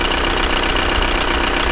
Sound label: golf, Vehicles